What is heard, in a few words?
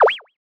poke